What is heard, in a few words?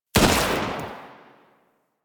Pistol Shot, weapons; Warframe; Thanotech